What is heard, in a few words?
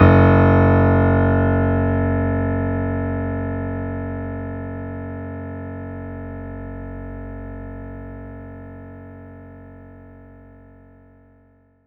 F#1, synth; 90 s Sample CDs; NiceBeats; SynthLine VOL-23